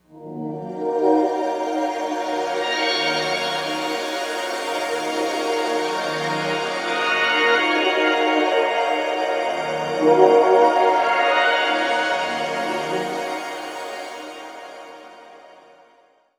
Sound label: Sparkle Minor, pad; Sonic Foundry (Sony Creative Software); James Johnson Spektral Minimalism; Pad FX